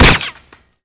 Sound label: pl gun1, weapons